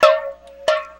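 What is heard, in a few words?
120 BPM; RHYTHMLOOPS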